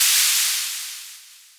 Shaker; Equipped Music; Loungin House

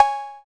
COW808, TR-808; 90 s Sample CDs; Sound & Vision - Gigapack I CD 1 (Roland); TR-808 & 909

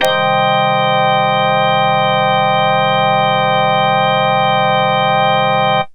ROCK OR; Drums

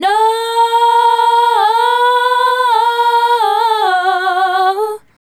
Female Group, Vocal

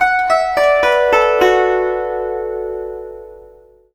GUZHEN; Spectrasonics; Heart of Asia

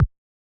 Yamaha DJX 2B, D# BD

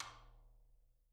STKRIM, Living Drums

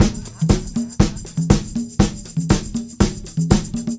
Combo, R&B Drums

